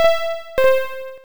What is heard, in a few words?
Chime; Doukyuusei; TurboGrafx-16